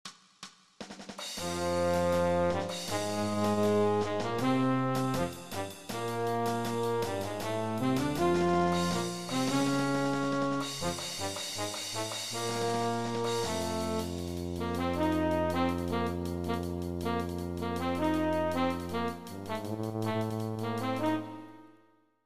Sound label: Fragment 160bpm no tpts